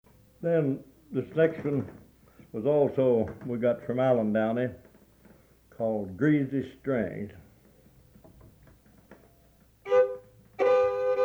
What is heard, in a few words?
greasy strings